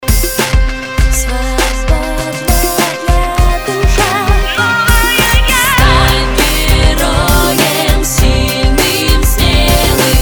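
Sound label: backing vocals with music; Bek-vokal s muzikoy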